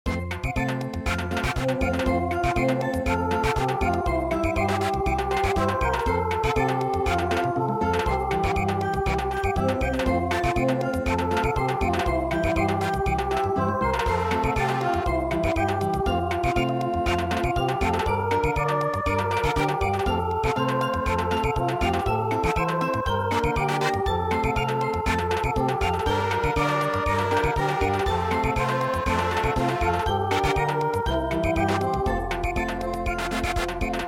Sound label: Samba, midi; Happy Time Has Come